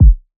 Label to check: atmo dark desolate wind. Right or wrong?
Wrong